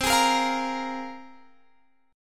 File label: C 7th Suspended 4th Sharp 5th, C, down, Guitar, Standard; strummed; capo=2